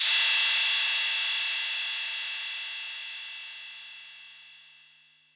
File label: Ride; Drums; Ableton Live 12 Lite Core Library